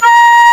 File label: Fairlight CMI IIX, WOODWIND